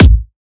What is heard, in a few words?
Kick, Drums